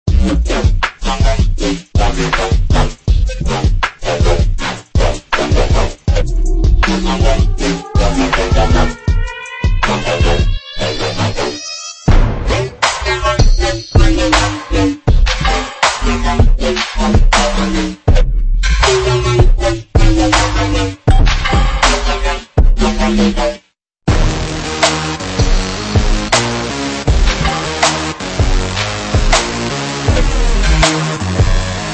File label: Trap; DJ Ride; Shaman Trap